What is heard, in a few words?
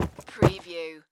Footstep Wood Jump